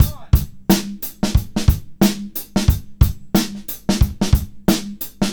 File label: Live Beats, R&B Drums